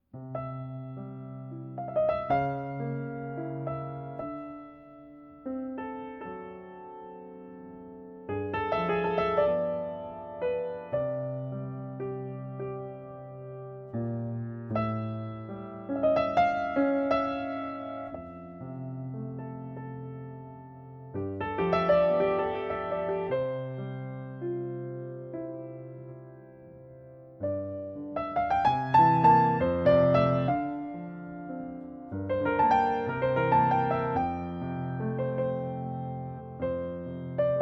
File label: Classical Piano Waltz